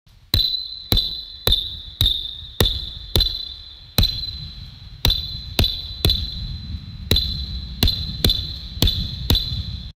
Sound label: net sounds for basketball